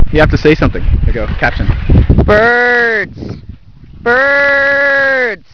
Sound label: bird